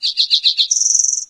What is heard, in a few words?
chirp, forest; video games; ambient; source engine; portal2